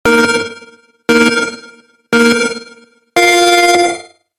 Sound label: racestart, zvuki starta gonki